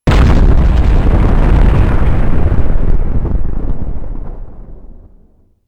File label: explosion big, heavy bomb; explosions